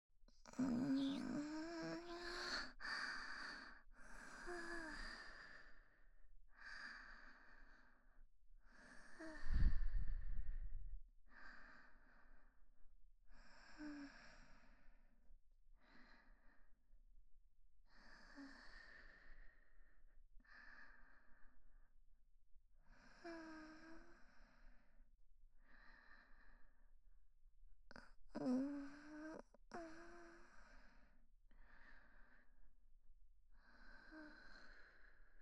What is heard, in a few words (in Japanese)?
寝息・彼氏と一緒に穏やかに眠っている彼女; ストレートお姉さん系カノジョ